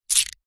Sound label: handful of coins close; foley